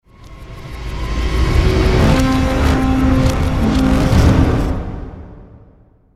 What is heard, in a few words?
Stinger Swell 5 Seconds